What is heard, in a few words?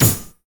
prism wave ally; LoL; lux